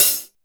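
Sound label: hi hat; DRUMS; USB Soundscan vol.03 - Pure Electro; AKAI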